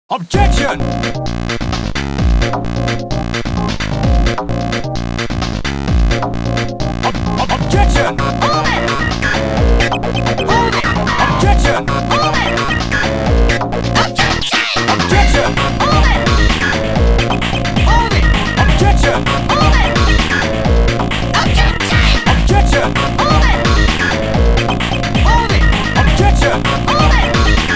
Objection Funk

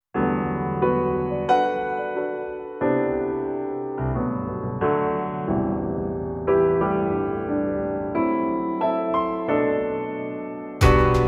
Instrumental

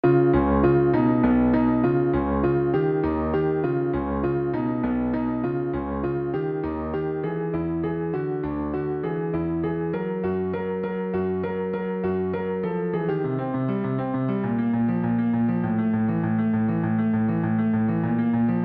guitar

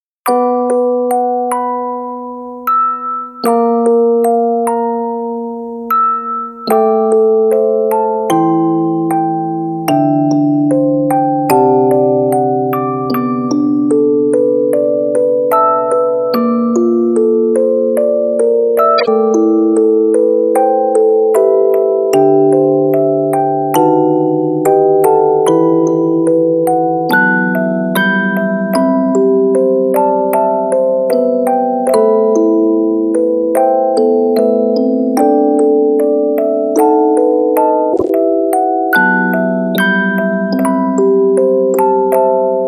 musicbox; Touhou

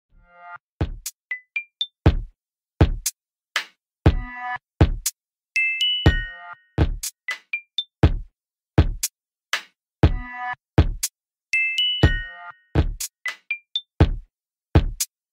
Keyboard; Yume 2kki OST; Matoran

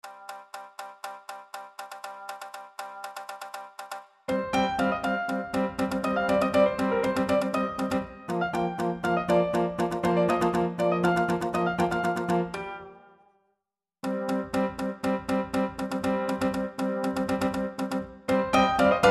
Shamisen

